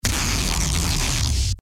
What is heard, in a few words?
SHORT CIRCUIT